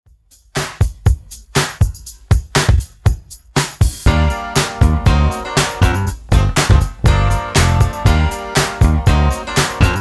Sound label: Redrum 120 Bpm